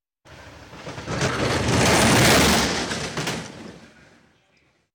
Walking Machinery Trucks

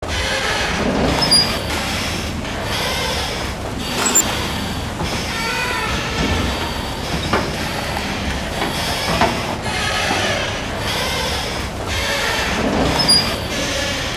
harbour dock; best service Blue Box; sea traffic

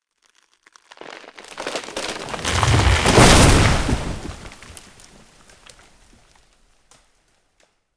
tree break; firefight

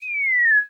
Cartoon Bomb Fall Whistle; SFX Kit; Foley - Misc

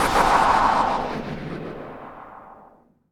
NOIS; XXL 800 Production Effects

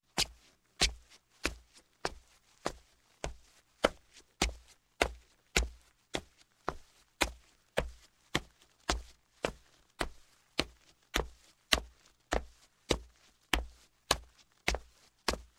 Footsteps, Wood Down Wooden Steps Sneakers; Sound Ideas 2000 Series

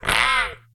Crystal Mantis Hit; Blind Quest - The Frost Demon

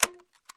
no bullets

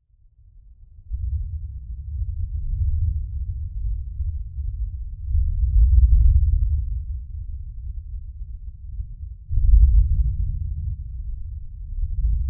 Thunder very distant booming; boom library; Thunder And Rain

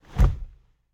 foley hit; driver; Blind Drive

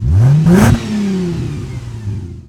98 Vette Rev; Automobiles

